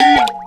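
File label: WASHEE BE, percussion metallic; Rarefaction; Poke in The Ear With A Sharp Stick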